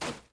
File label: thug fs sand, footsteps